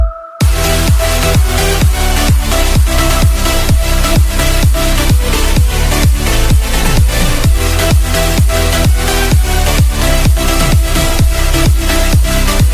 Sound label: best beats and dj